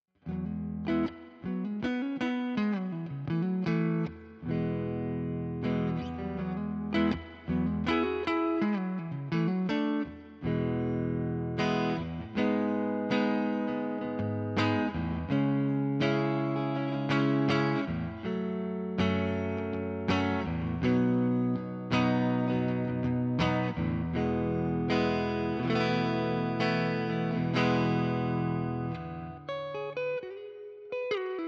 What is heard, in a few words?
Neck Clean, electric guitars; Gusta GTL 03i; gtl models